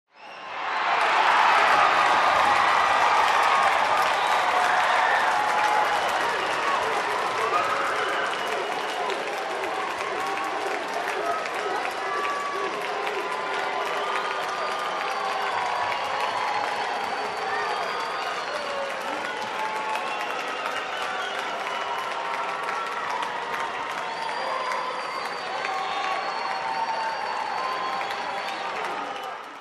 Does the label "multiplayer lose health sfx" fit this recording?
No